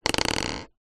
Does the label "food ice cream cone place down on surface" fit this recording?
Yes